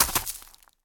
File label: land vines; Scrat